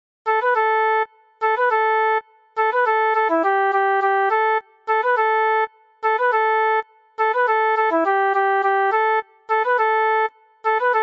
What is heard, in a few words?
Flute